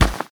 grass; anna

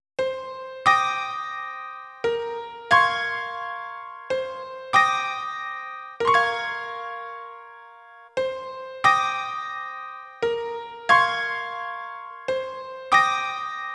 Rains

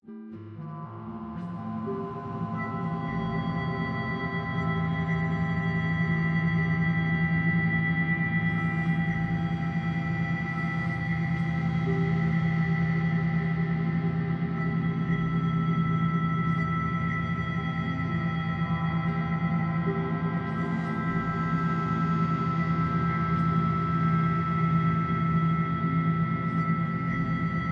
Effect Portal Sequence

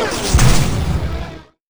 Bio Plasma Beam Start

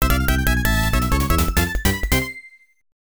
Fanfare; Don Den Lover Vol. 1 Shiro Kuro Tsukeyo; arcade

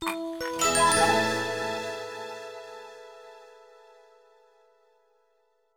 Clock Jingle; Strawberry Shortcake The Four Season Cake

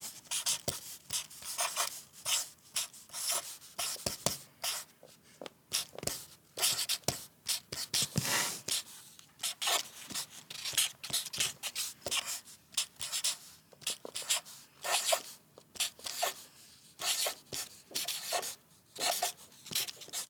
Marker Write

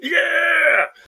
death; Froster

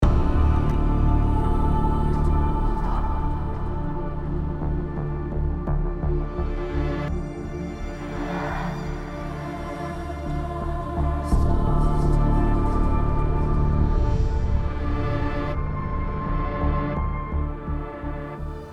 Music Ambient

